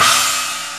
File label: CYMBAL, DrumBase5000; Crypton; CFZ KIT 2